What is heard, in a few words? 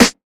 noisy snare drum sound a sharp key, acoustic snare tuned to the A# note; snare drum samples